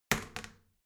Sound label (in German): toilet seat wall open regular, badezimmer